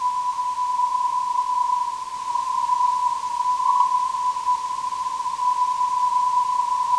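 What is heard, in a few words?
non-directional beacon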